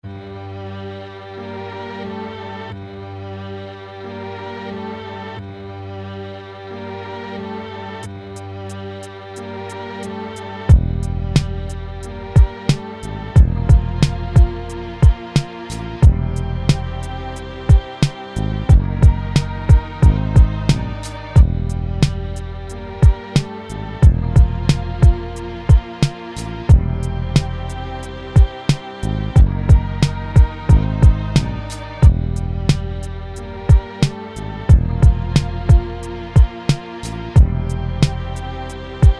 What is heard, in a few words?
beat